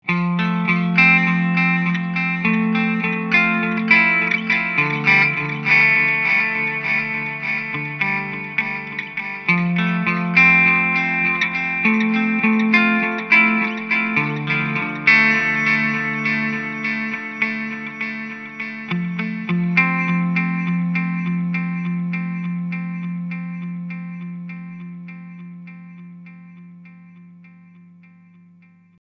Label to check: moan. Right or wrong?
Wrong